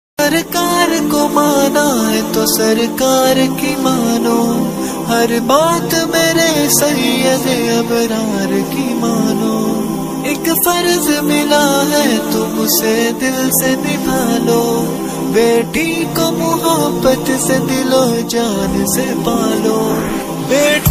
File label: islamic ringtone